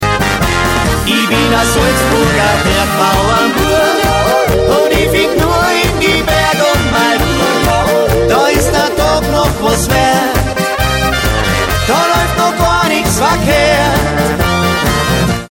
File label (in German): klingelton kurzversion